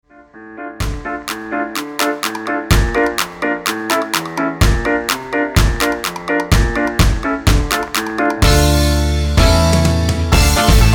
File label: SWING